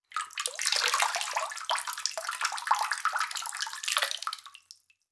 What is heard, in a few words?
Liquid Water Object Removed From Bath Tub